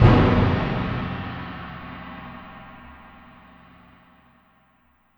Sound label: Hits & Explode